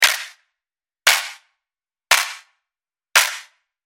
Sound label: clap progressivo